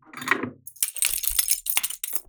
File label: doggo for a walk, Foley; TRUTH-HZ; Crowdsourced Sample Pack